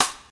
raw snare sample C sharp key, Loudest frequency: 5177Hz; snare drum samples